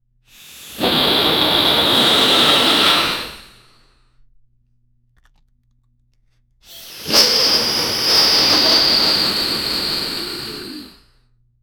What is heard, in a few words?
cartoon character inflating like a balloon; animation